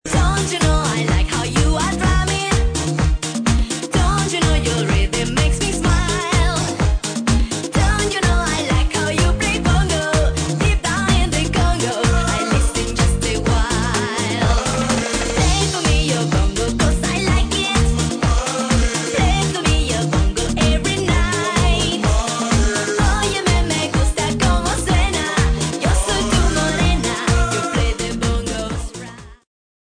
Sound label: bongo